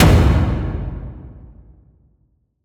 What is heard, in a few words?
Hit Drum Reverb; Music; Haunted Bloodlines; SFX Producer